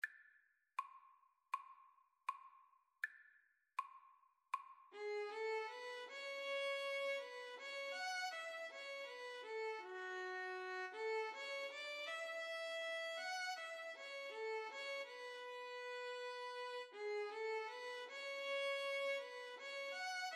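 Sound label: danny boy violin guitar karaoke; Violin-Guitar Duet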